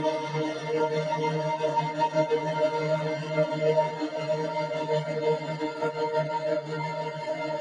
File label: pad granular Eb; base pack